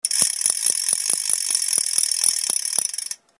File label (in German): Ratsche